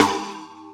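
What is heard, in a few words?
snare Flap, EMU Orbit-3 drum machine; 300 Drum Machines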